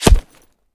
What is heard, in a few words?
body armor close; Escape from Tarkov